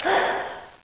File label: zombie ghost footstep; ghostfury